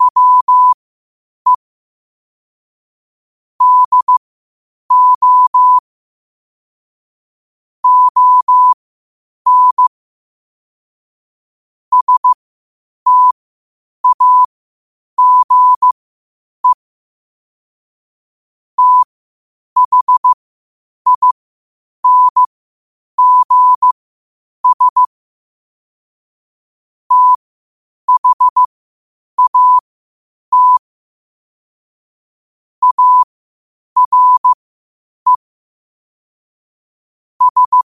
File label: 5 wpm, morse